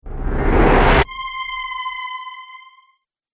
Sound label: Trailer Motion Picture